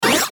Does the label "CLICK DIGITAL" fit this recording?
Yes